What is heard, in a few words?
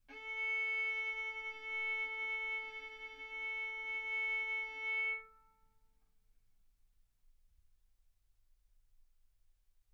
Violin arco mf sul A A4 B4 stereo